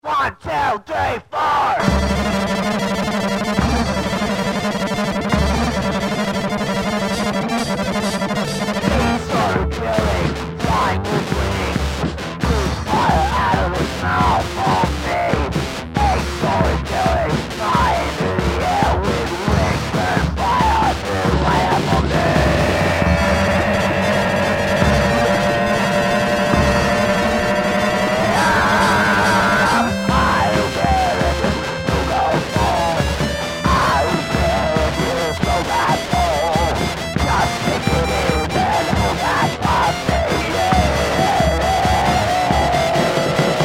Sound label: thrash song